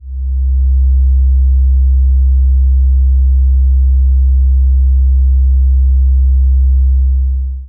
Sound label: Sine; uniCycle